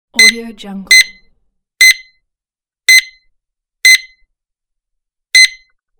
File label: shop scanner beeps